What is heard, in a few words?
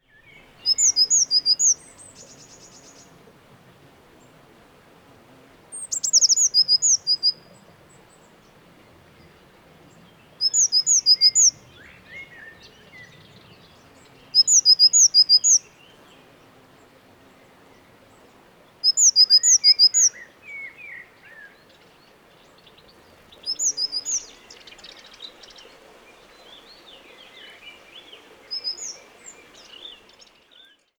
Cyanistes caeruleus; SETE